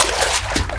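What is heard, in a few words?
step water right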